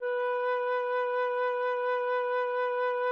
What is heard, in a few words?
flute B4